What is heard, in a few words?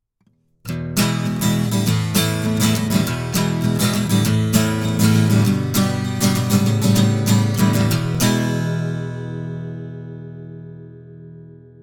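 Acc Gtr vintage bypass, acoustic guitar; Warm Audio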